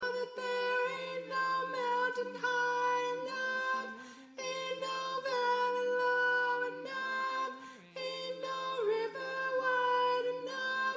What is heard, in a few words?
tenor